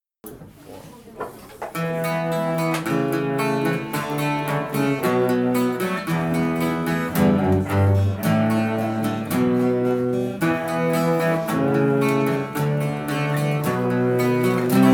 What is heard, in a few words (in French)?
accompagnement